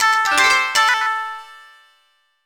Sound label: LEVEL UP; Izuna 2 The Unemployed Ninja Returns; Nintendo DS gamerip